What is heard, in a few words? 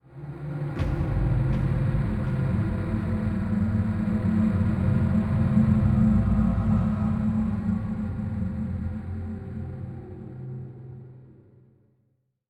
Music Shorts